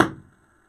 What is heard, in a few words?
hall